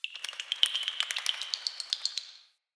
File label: cave shrine critter